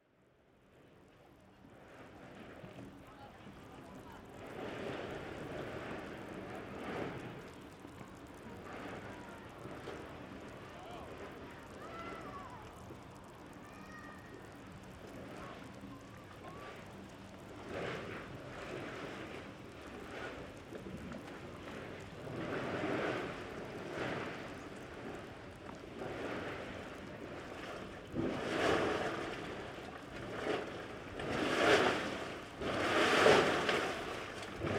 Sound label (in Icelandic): hverir Laugarvatn, Stóri hver at Laugarvatn